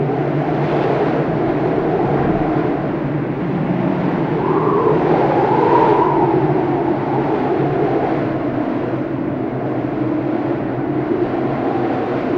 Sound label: Windy Loop